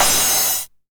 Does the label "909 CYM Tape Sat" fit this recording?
Yes